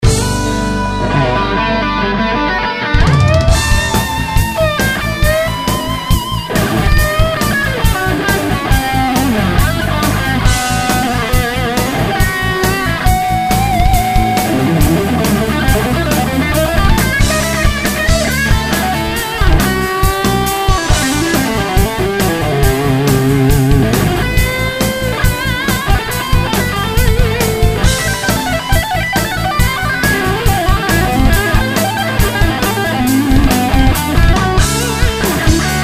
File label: lead; Rock Fusion